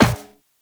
Operator Walk Metal Perforated, Footsteps; Warframe

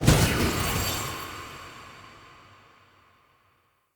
Freeze Mote Pickup Trail Move Burst; Motes

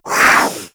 Kavat Vocal Attack Short; Warframe; Warframes; Khora

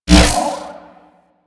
Kick Punch Whoosh; Warframe; Weapons; Corpus

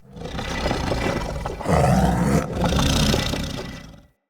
Corrupted Battle Dog Snore

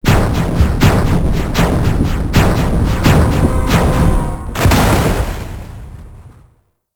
Boss Defeated Explosion Train; Warframe; Caliber Chicks

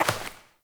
Step Dirt; Warframe; Koumei

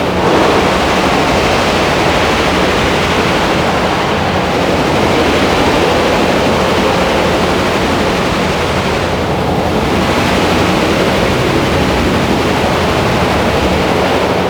Fortress Pod Loop; Warframe; Grineer Fortress

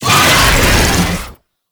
Infested Melee Start; Oni

